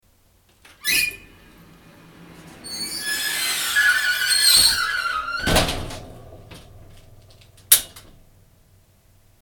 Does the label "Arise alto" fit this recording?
No